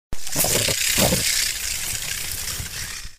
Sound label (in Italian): ISTRICE CRESTATO Histrix cristatus; museo storia naturale comiso